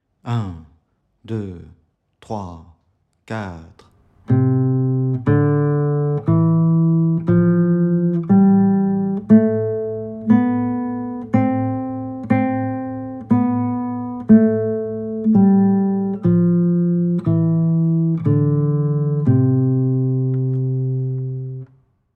exercice 060bpm, guitare

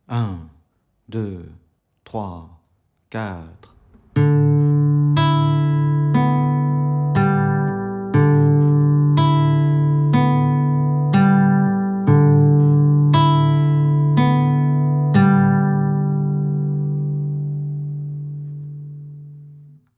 arpege descendant 60bpm

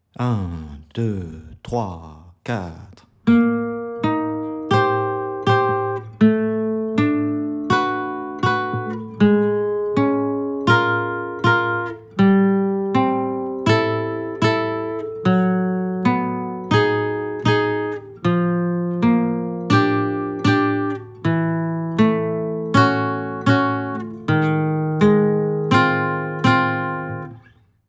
exercice demi barre sur 4 cordes exercice c 080bpm, guitare

